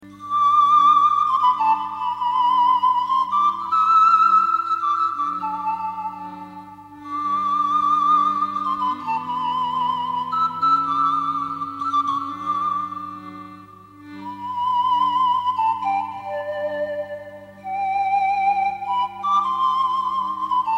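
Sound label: flute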